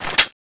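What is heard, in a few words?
stickybomb deploy, weapons; stickybomb red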